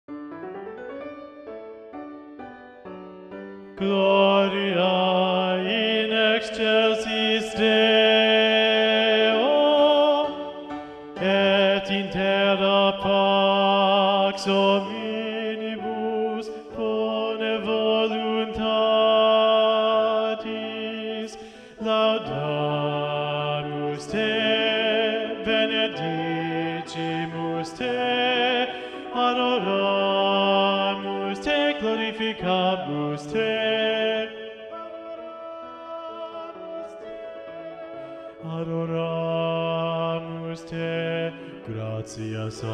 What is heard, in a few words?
Bass; Mass No2 in G Mvt 2 Gloria; Practice Room